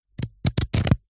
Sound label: office business cards wad flick through